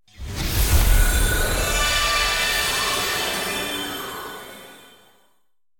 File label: random Symbol Transform; fairyhansel